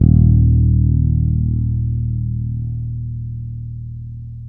BRITE FRETLS D#2, Bass; Spectrasonics; Bass Legends CD 1

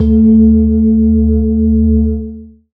Gong; Zero-G Jungle Warfare 1, 2 & 3; 90 s Sample CDs

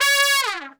C#3, Brass, TRP; Best Service; Brass Super Section

